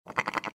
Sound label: sheep